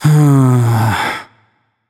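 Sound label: nonverbal sigh; voicepack; smite; merlin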